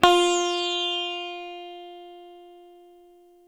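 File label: SITAR F 3 right, coral sitar, guitar; East West; Steve Stevens Guitar Samples Collection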